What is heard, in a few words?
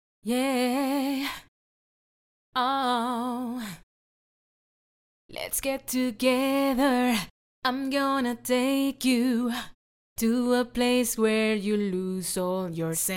acapella, pop 53 BM